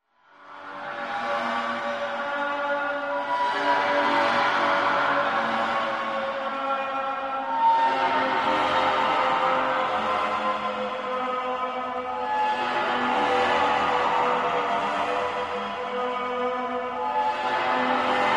Dry Ice Eerie Distant, Eerie Distant Metal Fluctuating. Dry Ice